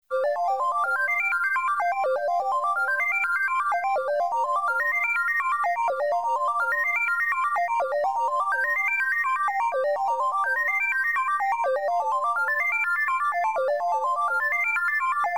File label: Pause, Amiga; Cardiaxx; Moonchild